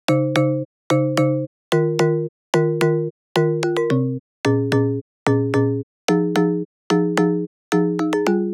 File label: Phase Plant